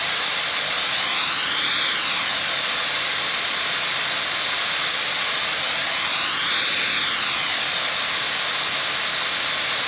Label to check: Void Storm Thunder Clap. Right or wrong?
Wrong